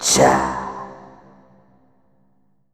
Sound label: CHAAA, TUBEVOX WET; Q Up Arts; Denny Jaeger Private Collection